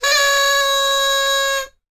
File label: whistle party noise maker; foley; sound effects; sound effects one